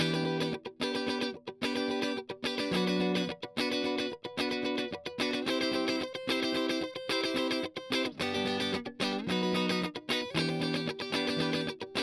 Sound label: Strum